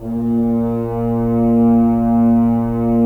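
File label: Solo French Horn; Roland - LA Composer vol. 4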